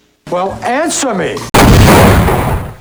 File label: exploding